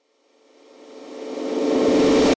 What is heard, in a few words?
Horror Crescendo Suspense Reverse; Blastwave; Sound Effects:Horror